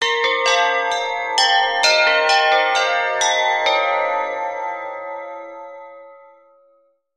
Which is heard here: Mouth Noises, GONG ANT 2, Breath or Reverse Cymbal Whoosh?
GONG ANT 2